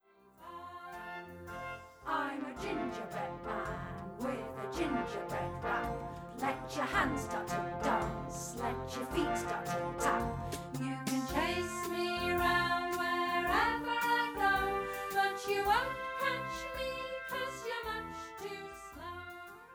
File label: The Gingerbread Man with vocals